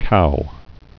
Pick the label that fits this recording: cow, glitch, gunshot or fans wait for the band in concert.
cow